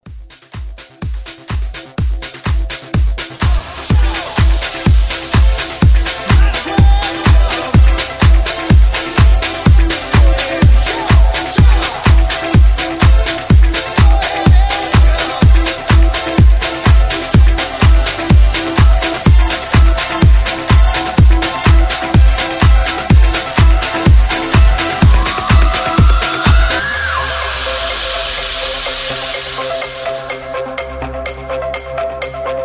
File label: Body Funk